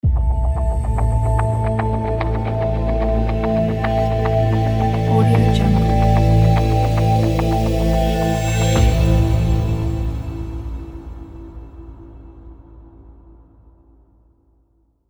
abstract crystal logo

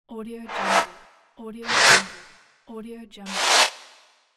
swoosh

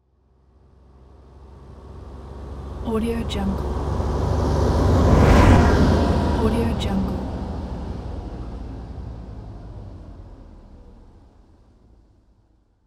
bulldozer pass by

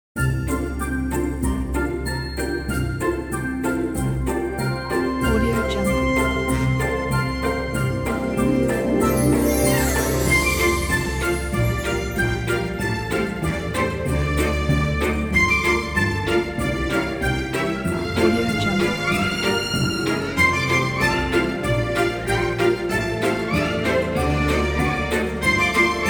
santas sleigh ride

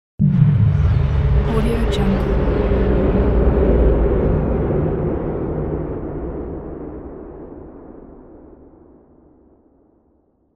paranormal activity

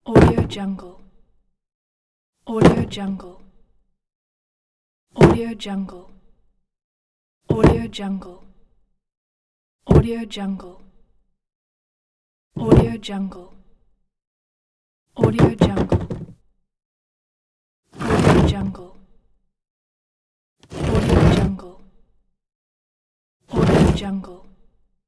box; soundsground

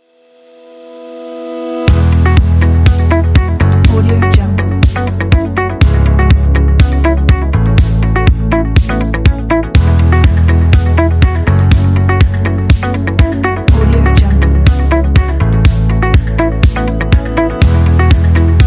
positive ambient simple

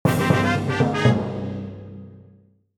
musical theme adventurer victory; mobile game; esmteam

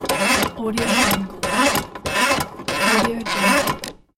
telephone old windup cranking slow

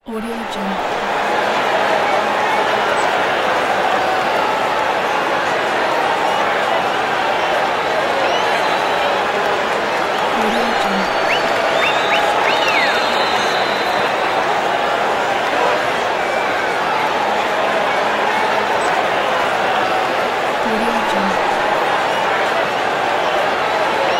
crowd ambience

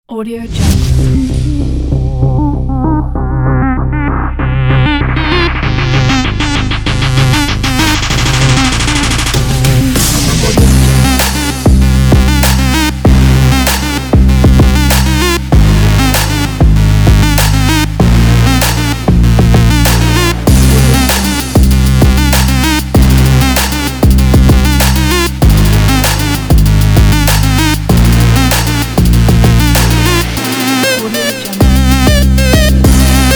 trap

